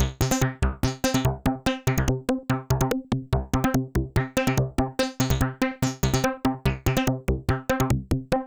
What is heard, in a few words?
C4, Synth; 90 s Sample CDs; NiceBeats; SynthLine VOL-21